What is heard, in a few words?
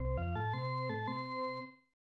Organ Music; NHL Stanley Cup; SNES